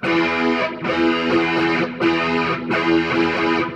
Bar Chord C

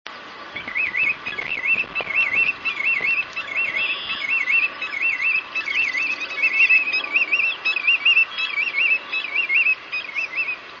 willets; birdsongs

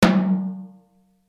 unmuffled no wires

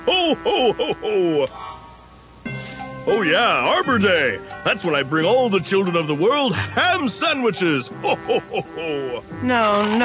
hohohooh

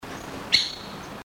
White backed Woodpecker

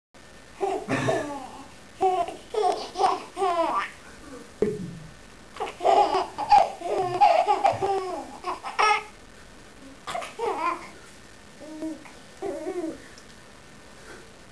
giggling

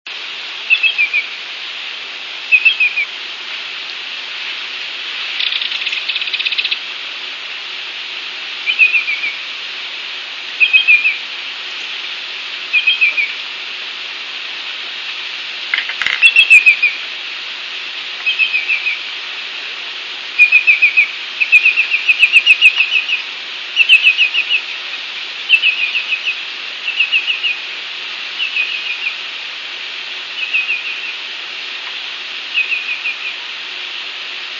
Yellowlegs Greater; birdsongs

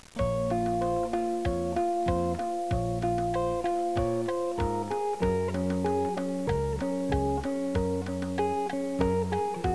Rumba

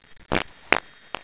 fart

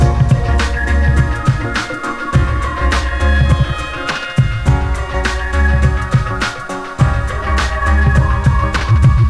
downtempo

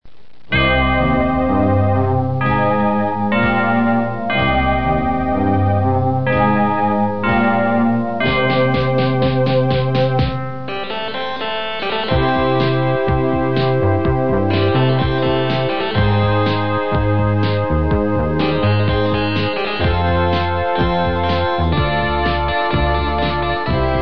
closing music; clue

